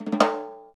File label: Folk Snare Flam; Roland BK-5; Snares